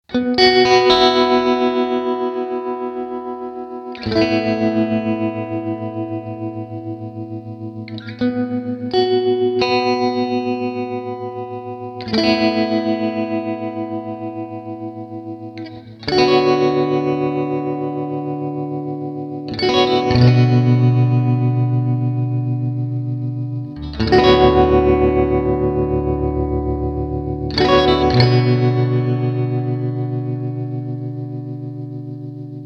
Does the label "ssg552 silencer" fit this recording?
No